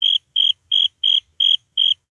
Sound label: Amb Animals; Red Orchestra Ostfront 41-45